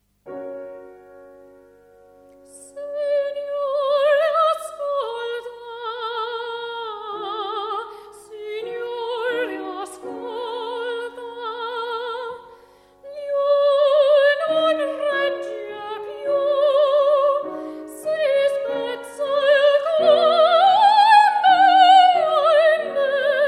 Opera Singer; UK Opera Singer

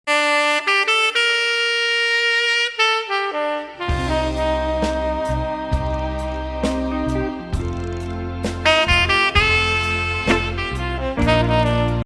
Jazz Saxophonist; Albuquerque

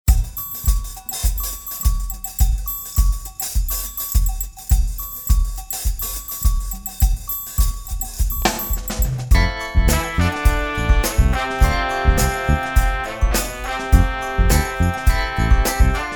RB Band, R&B Band; Portland

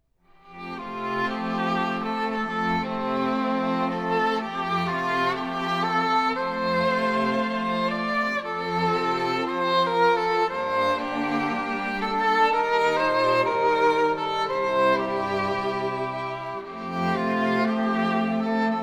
String Quartet; Dallas

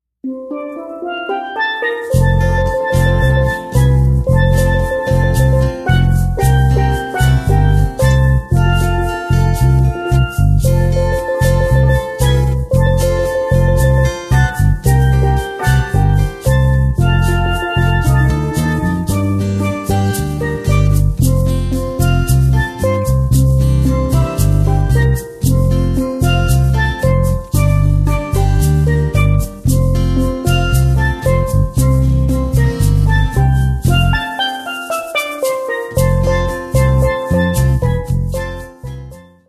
Caribbean Band; Los Angeles Caribbean Band

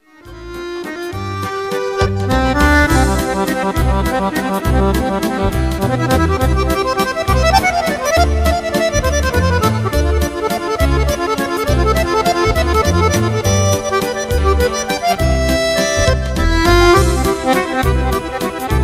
Accordionist; Houston; This Houston Accordionist was born in Italy

